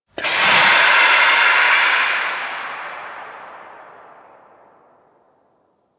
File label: Terraform Machine Gas Burst Distant C; Asteriod Transition